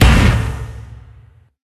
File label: Melting Furnance Attack; Dark Army